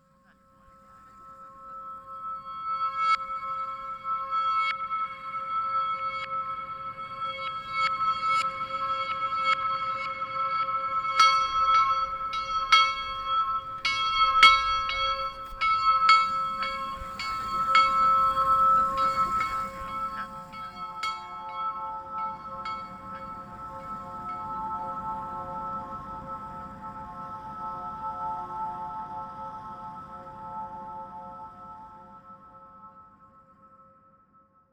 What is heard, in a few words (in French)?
Cloche montage; Cerisy